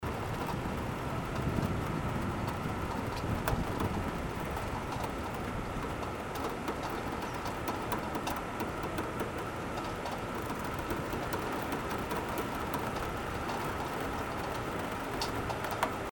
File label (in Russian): zvuk dozhdja